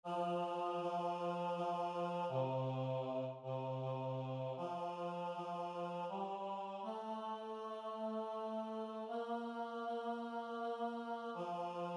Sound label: Baritone Bass